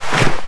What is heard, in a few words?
Clothing; Player; Footsteps